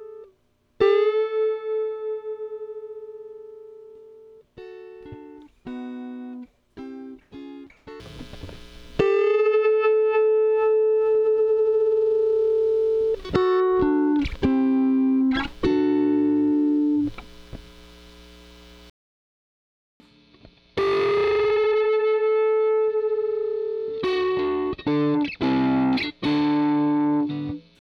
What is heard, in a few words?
bend phasing